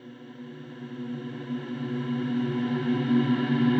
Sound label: reverse, vocal sample